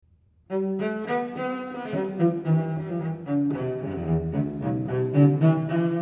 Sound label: Cello